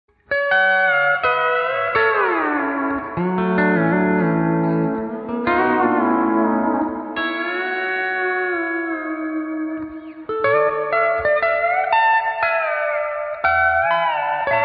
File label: Spring; ToneX Pedal; Spring reverb